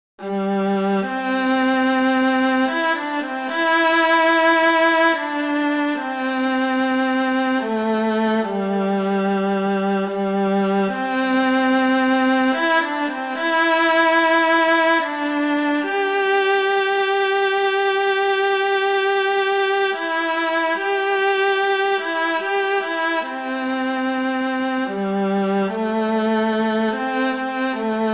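Traditional Amazing Grace, Very Easy Collection viola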